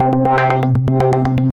Stardazed Pattern, Synth Rhythmic, Wavetable; Instruments; Ableton; Factory Packs; Synth Essentials

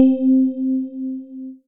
Tiney Piano, Synth Keys; Instruments; Ableton; Synth Essentials; Operator

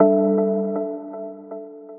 Train Dream Plucks; Piano & Keys; Ableton; Skitter and Step

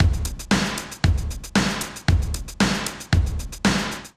groove 115bpm, Drum Loops; Neon Midnight; Ableton; Singularities

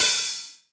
Hihat Open Old School; Drum Hits; Ableton; Factory Packs; Session Drums Studio; MPE Hits

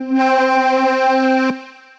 Keys, Synth Keys; Ableton; Factory Packs; Vibrations; ModeAudio; Lowrider

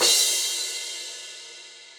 Crash 17 Session Dry, Cymbal; Drums; Drum Hits; Standard Hits; Session Drums Studio

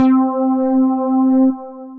dark drone pluck; Synth Misc; B.Beetlez Dark Dronepluck; Ableton; Factory Packs; Beat Tools

